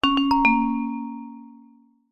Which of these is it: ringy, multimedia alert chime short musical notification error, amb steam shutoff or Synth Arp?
multimedia alert chime short musical notification error